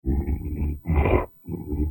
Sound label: Pet Buffalo Idle Look; creatures pets; Lego